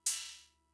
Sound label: Cymbal; Fricke MFB-522